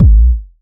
verby kick single hit a sharp key; kick drum samples

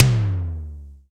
big room tome drum sample c key

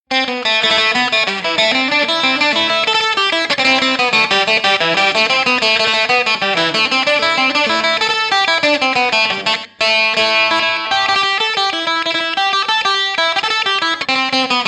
irish bouzouki folk amp sequence; loops

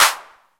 reverb clap single shot c sharp key; single hits one shots

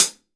juicy hat sample g sharp key

